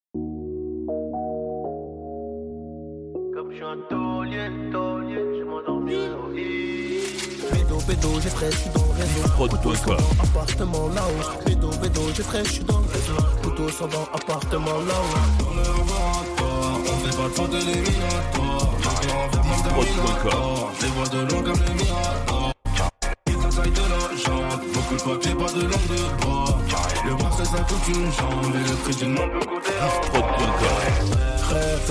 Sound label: Medley Urban FR